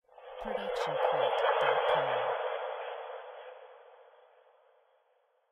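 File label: sci fi ambiance; soundscrate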